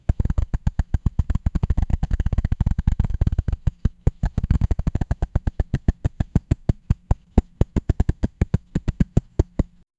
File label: game intro